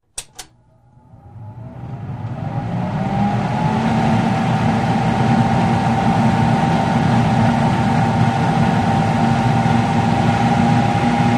Overhead Stove Fan; Oven Hood Ventilator Fan; On Click Followed By A Long Hum-like Spin Into An Off Click. Kitchen, Restaurant.; Fans